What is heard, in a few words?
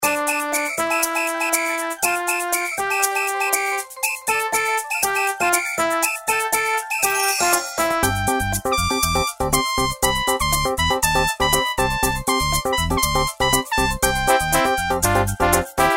samba break; エレクトロニカ; 明るい; BGM